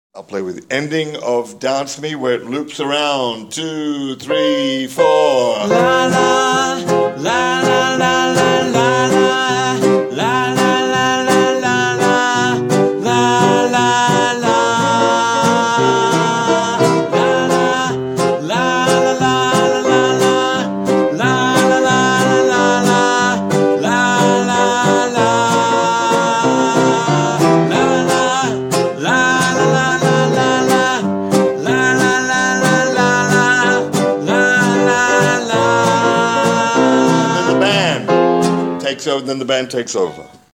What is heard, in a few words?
DANCE ME LOOP TOP ENDING LOOP